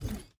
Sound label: Hand Paddle Release Extra Light